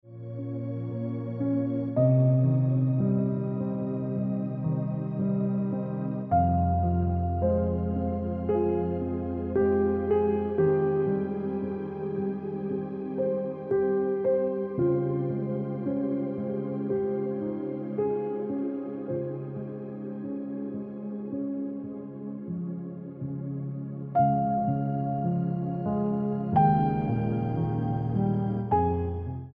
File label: Piano Music